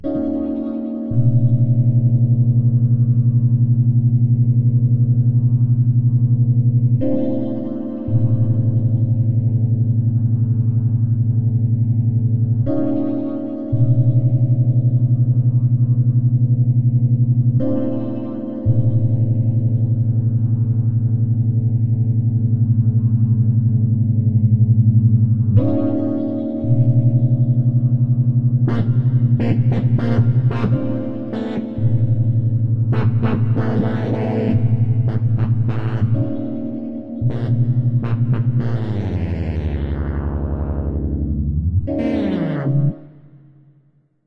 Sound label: could be an intro